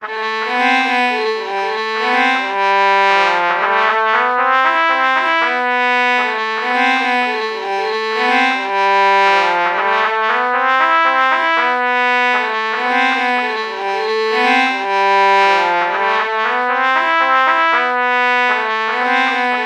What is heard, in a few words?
trumpet violin lpc true melody morph low